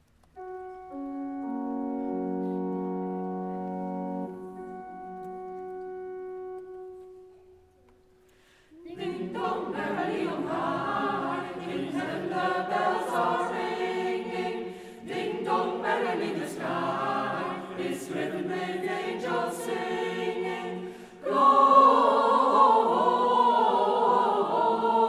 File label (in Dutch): ding dong